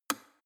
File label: plastic water boiler turn switch off hard